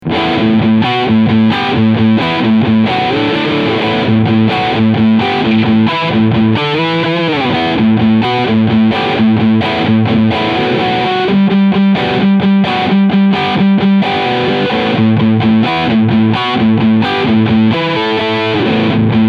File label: Deluxe Atlantic Riff Bridge Pickup; DAngelico